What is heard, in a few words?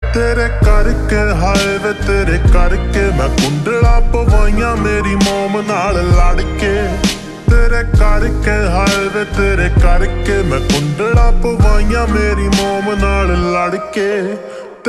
slowed and reverb